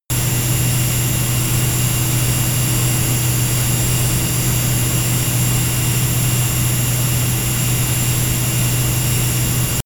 plane bombardier learjet turbine full power direct; flugzeuge verkehrsflugzeuge; verkehr transport